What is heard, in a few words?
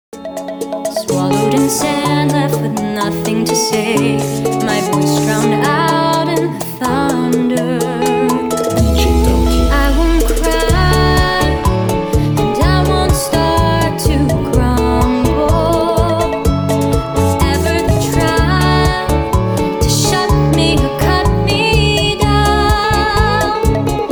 bachata